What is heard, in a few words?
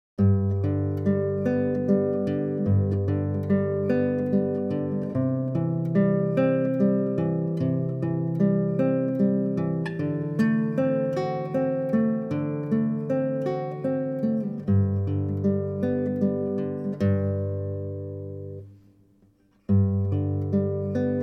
arpeggio